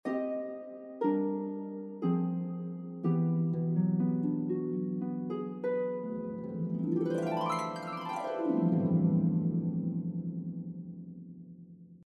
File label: Harp